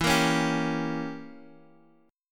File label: E Diminished 7th E down Guitar DADGAD